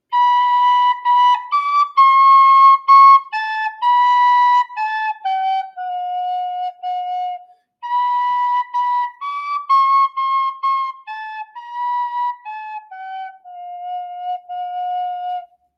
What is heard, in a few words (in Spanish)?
flauta